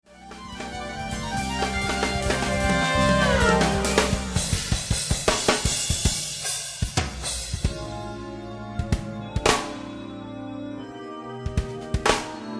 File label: Drum Solo; Vinnie Colaiuta